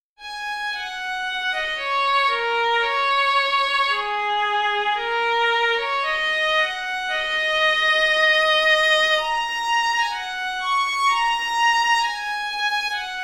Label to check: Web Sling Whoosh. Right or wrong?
Wrong